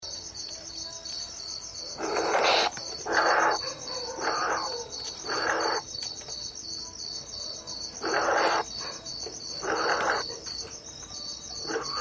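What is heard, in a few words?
Sykes's nightjar, bird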